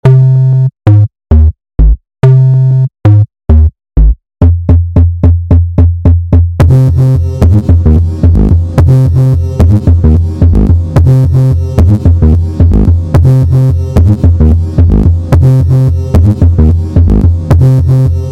Bass

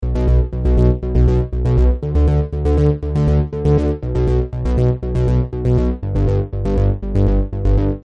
Synth Bass

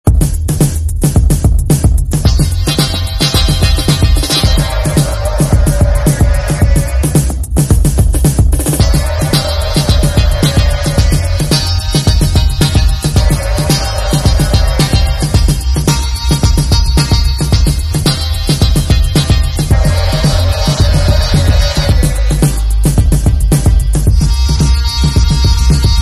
Arp bass Solo gytar

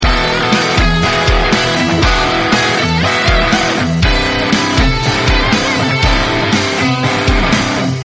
w bass

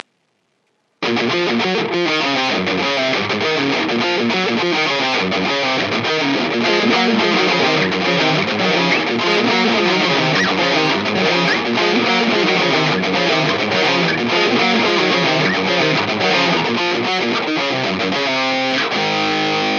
Metal

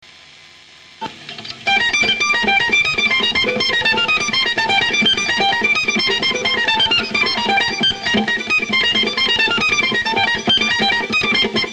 viola

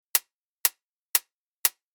hat rev

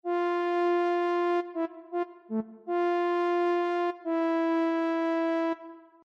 Brass Sample

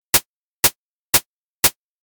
hat

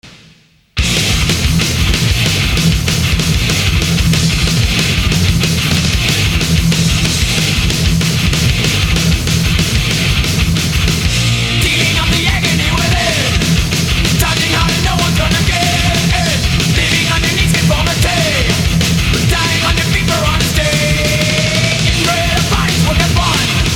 Music

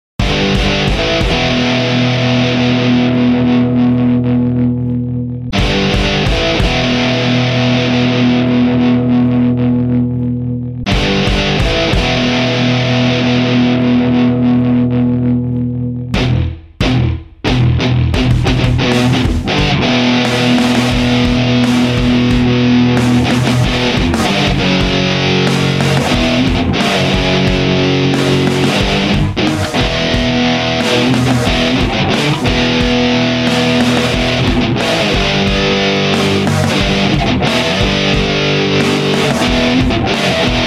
Music

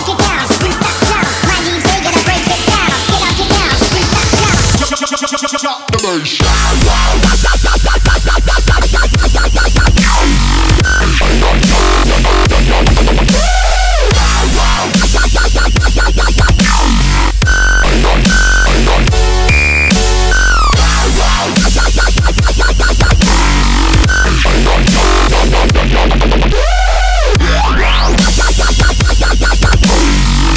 dubstep

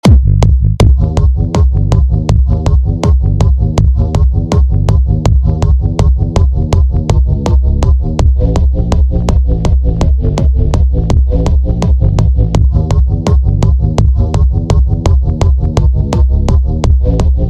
Bass

